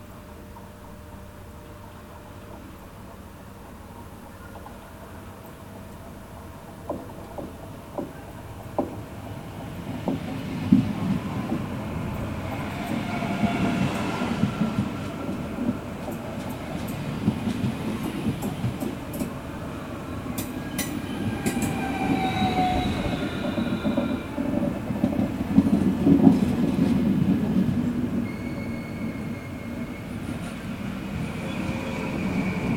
Dutch Train Coming

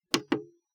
Button

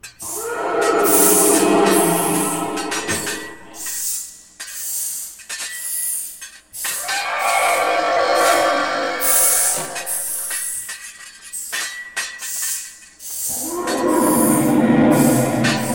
Sword Fight With Monster

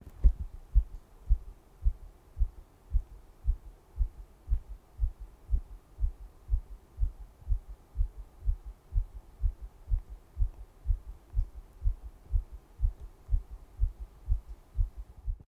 Heartbeat

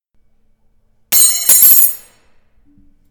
Knife Drop

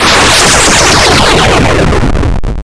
Destruction 8 Bit

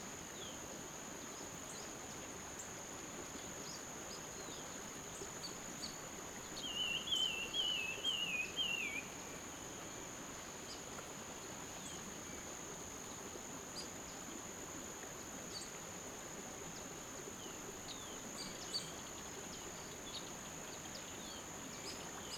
Birds And Water

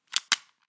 Weapons Gun Small Reload

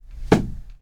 Step

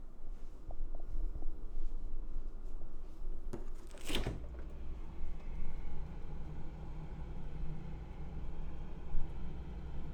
Opening Fridge

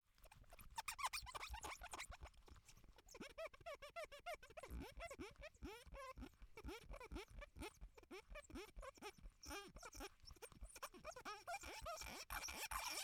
Squeaky Glass

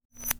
Short Zing

